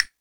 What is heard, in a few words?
ELECT PERC; OMI - Universe of Sounds